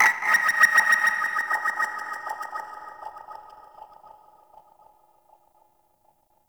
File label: Grineer Frogman Attack, Vocals; Warframe